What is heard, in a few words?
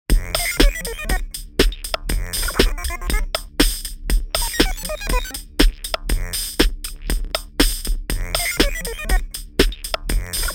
Chip Funk